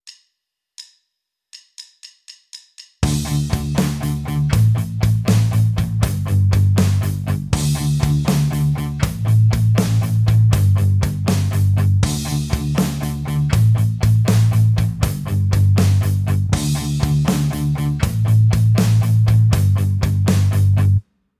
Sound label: Tapped Harmonics, guitar